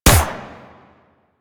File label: Harpoon Gun Shot; Warframe; Sharkwing; Grineer